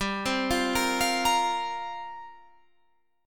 F Suspended 4th G; Fsus4/G; arpeggiated

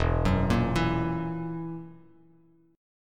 E Suspended 2nd Flat 5th E down Bass Standard

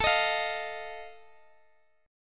Eb Suspended 2nd Flat 5th, A

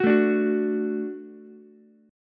F sharp Minor Sharp 5th, Ukulele; strummed

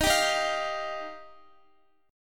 Eb Major Add 9th, Guitar; x,x,15,12,15,13; 4F,4A,5C,5G,5C,6E; strummed

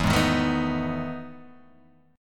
E Major 11th E, Guitar, Half Step Down

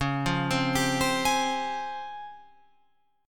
C sharp Major Sharp 11th, Guitar Open F; C#M#11; arpeggiated; tuning=Open F; guitar chord

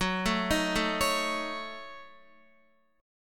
Gb Minor 6th, Guitar; arpeggiated; JGuitar

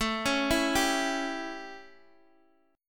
A 7th, Guitar DADGAD; chord

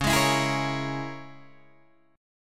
Db Minor 6th Db down Guitar Open D

